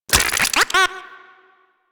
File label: Vomvalyst Lure Move; Warframe; Teralyst